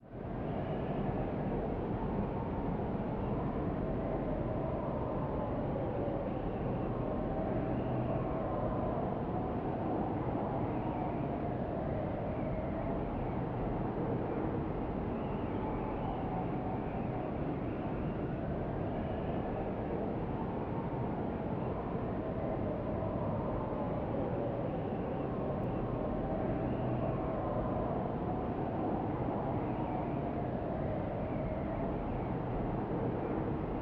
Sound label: Wind; Fire Emblem Warriors Three Hopes